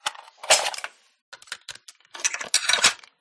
dp28 reload, guns

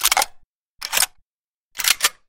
famas reload, guns

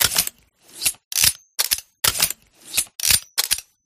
ot38 reload, guns

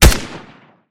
scar, guns

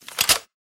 mac10 switch, guns

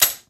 empty fire, guns

